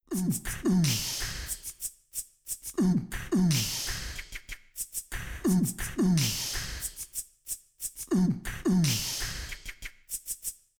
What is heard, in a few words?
Mouthbox Mic Samples